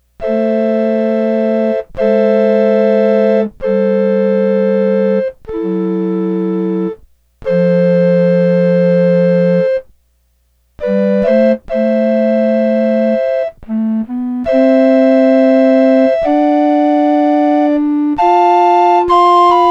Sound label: RECORDERS